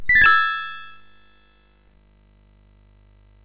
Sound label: sid, MOS6581R3